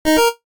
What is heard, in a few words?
Music Jingles, retro; Kenney